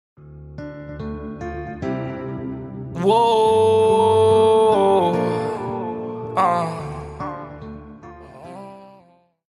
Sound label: woahhh